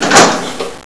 gate; DarkCity

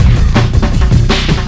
zaza beat; syowa japan; svencoop

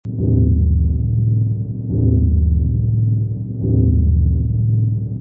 engine throb; ambience

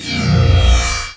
laser; svencoop; turretfortress

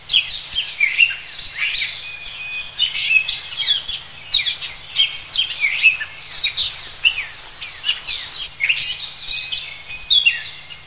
jungle field; dmc

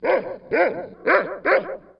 dog

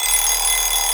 alarm clock; svencoop

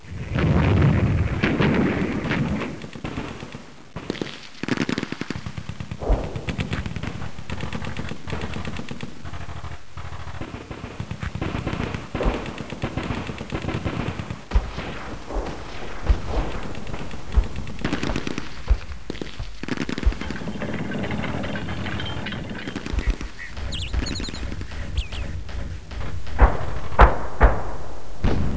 french country; cstrike

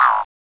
cricket jump; gearbox

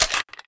pkm deploy; firearms

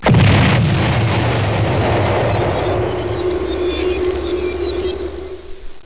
explosion; tfc

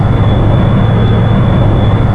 mouseship idle loop; gearbox; gunman

